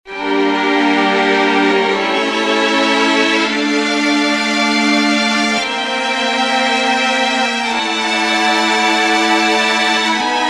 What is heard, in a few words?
3 Violins